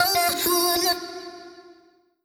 phrase Fm, Vocal; Slate Digital Omnivox Vocal Sample Pack

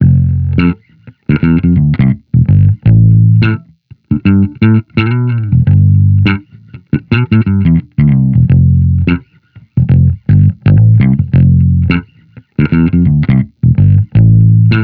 Amped loop 85 Am, Electric Bass Loops; Slate Digital BASSHOLE Sample Pack

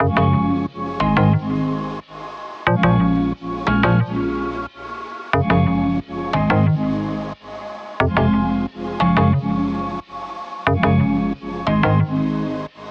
songstarter 90 C#m; Slate; SD PASADENA Pop Sample Pack

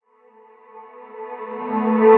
vocal atom Am; Slate; AURORA Sample Pack; Vocals

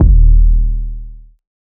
808 breakdown Eb; Hip-Hop; Tonal; Slate; CAICOS Hip-Hop Sample Pack

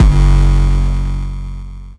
808 Bass Savage F#3; Slate 808 Deep Dive; SD Signature 808s

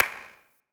clap one shot pure; Pop; Drums; Slate; SD PASADENA Pop Sample Pack

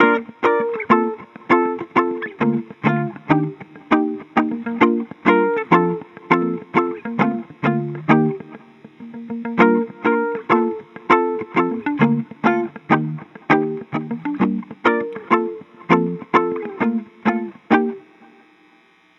songstarter 100 Bb; Slate; SD PASADENA Pop Sample Pack

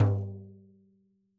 daf Single hit; Slate; SEISMIC Drum Sample Pack

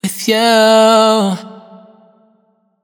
hook With You 126 Gm wet, Vocal; Slate Digital Omnivox Vocal Sample Pack

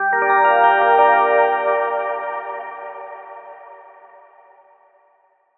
bell dreamy Gm; Melodies; One Shots; Slate; AURORA Sample Pack

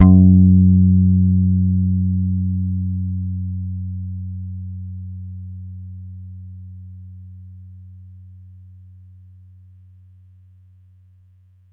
Bass F#, Electric Bass One Shots; Slate Digital BASSHOLE Sample Pack

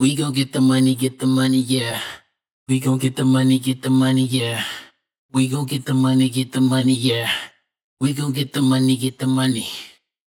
hook Get The Money wet, Vocal; Slate Digital Omnivox Vocal Sample Pack

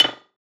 perc pang; one shots; SEISMIC Drum Sample Pack; Slate

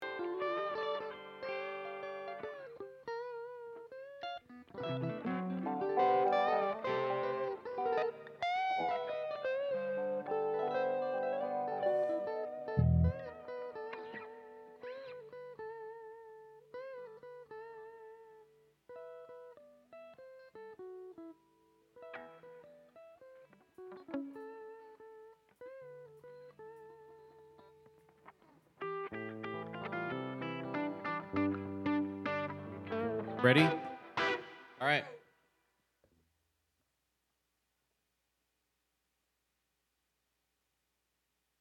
Vocals

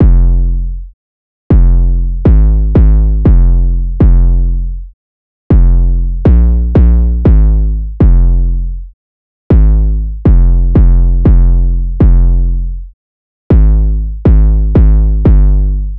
808 loop 120 Fm; Slate Digital BASSHOLE Sample Pack

